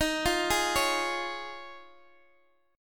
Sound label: D sharp 7th Suspended 2nd Suspended 4th, Guitar, DADGAD